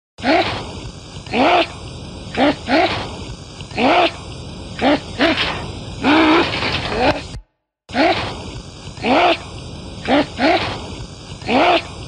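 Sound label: sable voice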